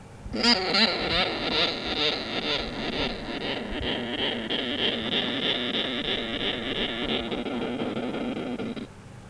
Petaurus breviceps buzz; Sugar glider; Uninterrupted call at expiration and inspiration produced from the shelter at disturbance by a human.

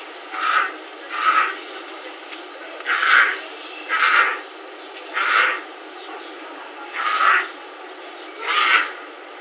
Felis concolor; Puma; The female is calling her cubs

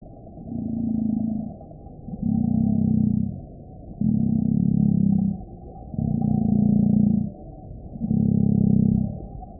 Dromaius novaehollandiae; Emu; Very deep growling; Drumming calls are audible only at a close range to a bird

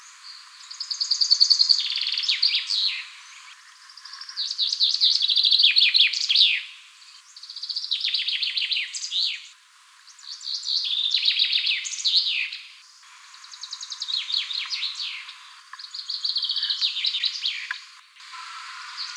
Fringilla coelebs all; Although the eight songs from eight different male chaffinches are similar, but the trained ear catches distinctiveness

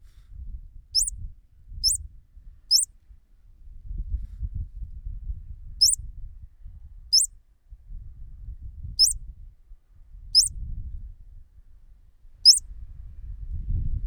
Spermophilus erythrogenys; Red-cheeked ground squirrel; The alarm call

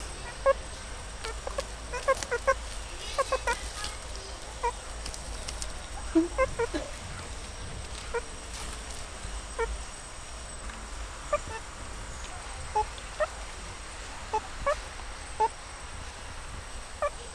Mungos mungo contact

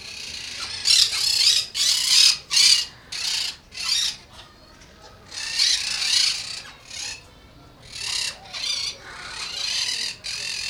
Myiopsitta monachus; Monk parrakeet; Screams of a large monk parrakeets' flock

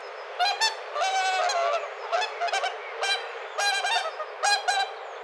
Cygnus cygnys duet; Whooper swan; Duet call of a breeding pair

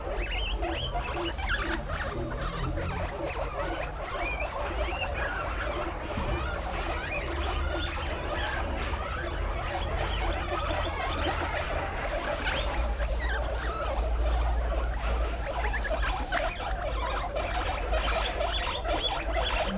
Cavia aperea; Guinea-pig; The non-interrupting purling in a large group of animals

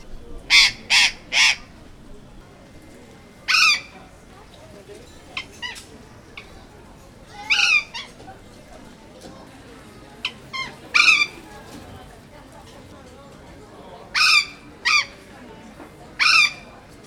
Cyanoliseus patagonus group; Burrowing parrot; Calls at friendly contacts in a flock

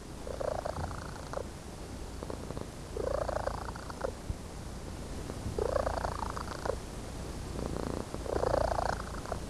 Felis catus purr, domestic animals; A cat in a good mood purrs when communicating with her girl owner

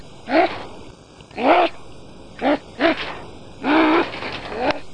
Martes zibellina

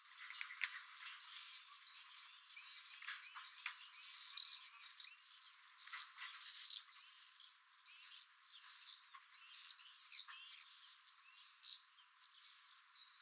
Apus apus flight; Common swift; A flock calls-over at flight; Apodidae (Apodiformes)

